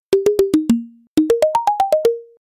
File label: clay drum